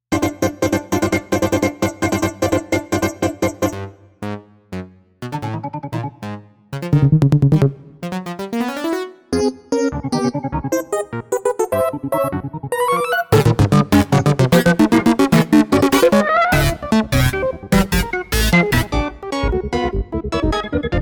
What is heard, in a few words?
SYNTH